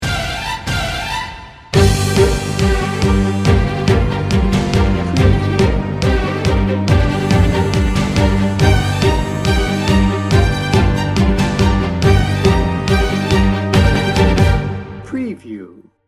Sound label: breaking news